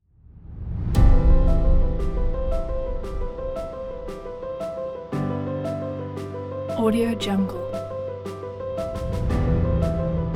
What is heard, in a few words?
inspiring cinematic